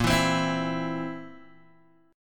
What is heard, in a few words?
Bb Suspended 2nd Flat 5th Guitar Open G